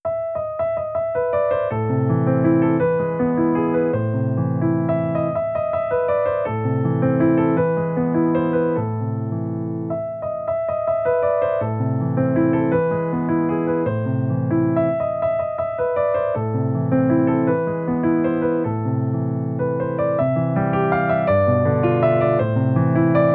Fur Elise Bagatelle in A Minor, piano; beethoven

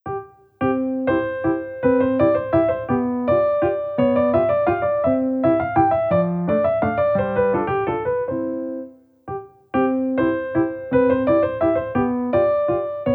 German Dance, piano; beethoven

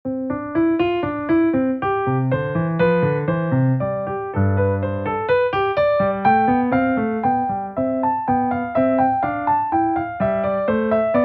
Invention No. 1 in C Major, piano; BWV 772; Bach; piezas faciles piano

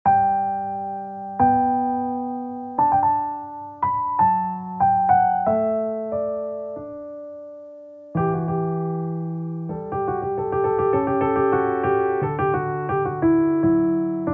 Aria, piano; Goldberg Variations; BWV 988; Bach; piezas faciles piano

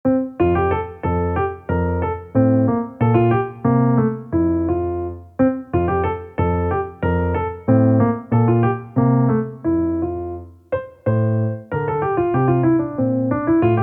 piano; Wachet Auf Ruft Uns Die Stimme; BWV 645; Bach; piezas faciles piano

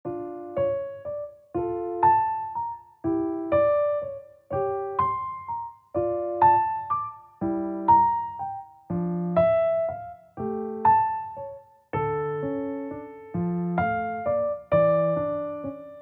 Lacrimosa Requiem Mass, piano; K. 626; Mozart

